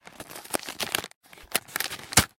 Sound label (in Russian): shokoladnaya konfeta v bumazhnoj obertke